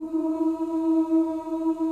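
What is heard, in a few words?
F3 FEMALE CHOIR; CHOIR OOS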